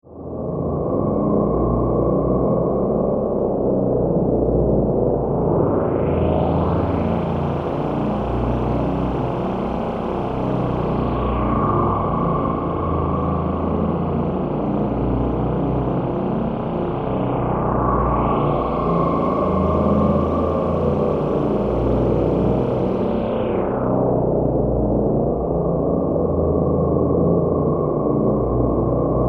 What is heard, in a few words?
drone